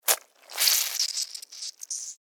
Mosquito Bite, Animal, Mosquito, Bite, Suck, Blood, Insect, Bug; Mosquitoe; Mosquitoes